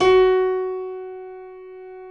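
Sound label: KEY G flat 3, YC7 Piano mf; Roland L-CD701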